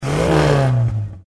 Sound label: jump kart; disneyxd; stuntastic